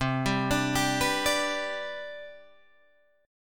C Major 7th Suspended 2nd, C, down, Guitar, Open G